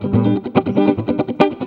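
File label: JAZZ GUIT GROOVE; Best Service; World Colours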